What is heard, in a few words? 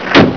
door close; fgdata